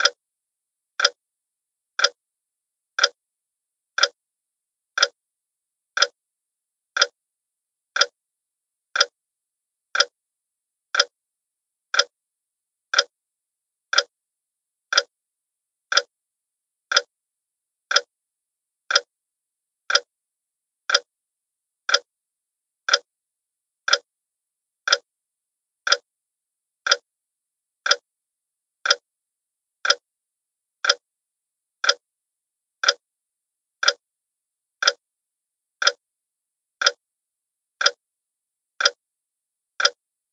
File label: House Clock